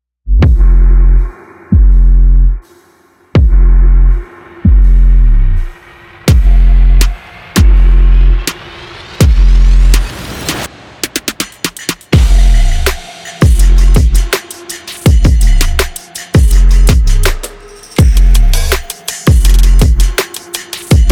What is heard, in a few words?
vlog beat background